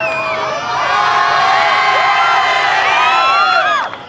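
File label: Crowd Small Cheer Classic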